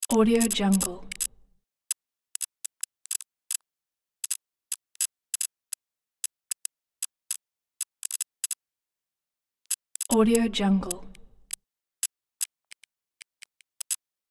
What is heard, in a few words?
flickering neon